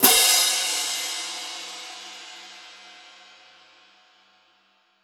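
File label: 20 PIATTI, CYMBAL CRASHES; Voice Crystal - Steve Reid Definitive Percussion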